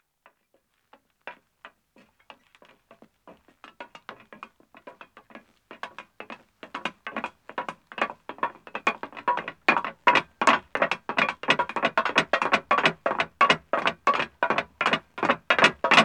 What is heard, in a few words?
Metal Stairs Descending, 2 Men, footsteps; BBC Sound Effects Library